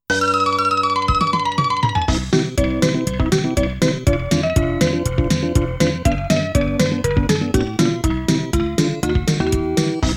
Buoyant & cheerful; Estpolis Denki 2; Lufia 2 Rise of the Sinistrals

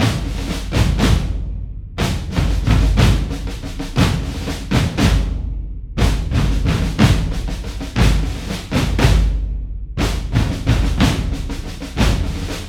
Drums; For Honor

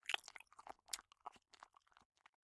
Eat; Mita; miside demo windows gamerip 2023

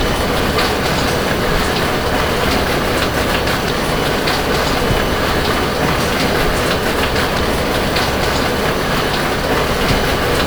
loop conveyor motor

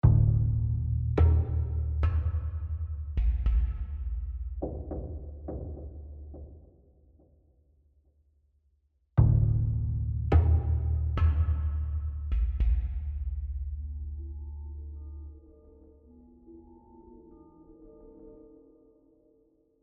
Tension Low

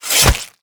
Fight Attack Hornes; mighty party 2017 gamerip pc ios android

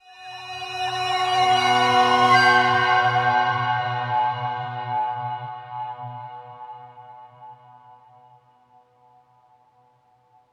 SpaceshipEnter; Doctor Who The Edge of Time

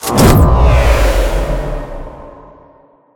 Ui Game Event Complete No Win; Pacer

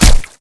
Damage; Monster Squad

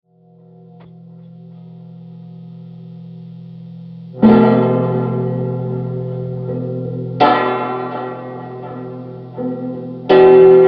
Plucked Guitar Explore Mood; gamerip; the last of us

